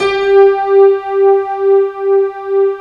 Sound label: piano pad G4